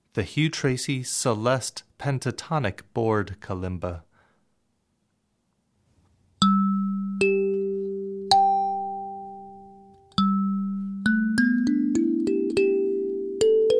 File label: Pentatonic Example, kalimbas karimbas; African